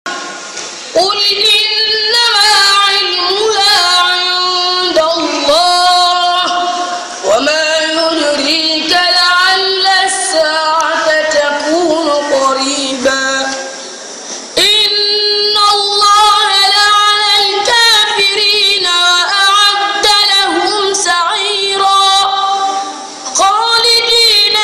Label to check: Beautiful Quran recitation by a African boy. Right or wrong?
Right